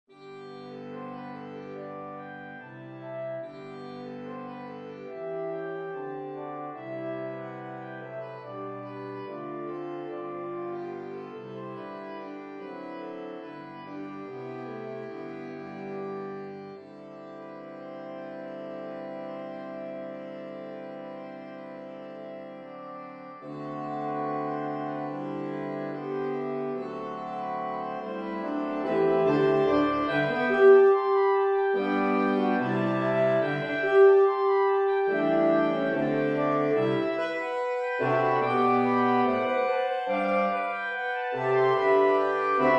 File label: Walking Tune Sax Ensemble; Grainger